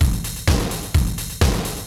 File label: drum loop